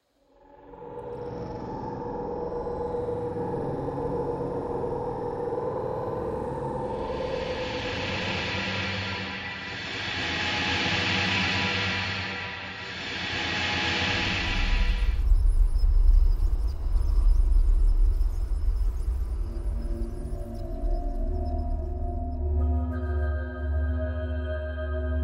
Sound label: long evolvers